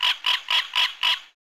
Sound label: Swamp Creature; Levels Hub Swamp; Hub Swamp Creature Amb